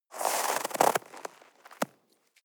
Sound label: step snow walk single